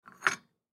Tea Cup Pick Up, Tea Cup Pick Up Dining Room Table; Dishware; Blastwave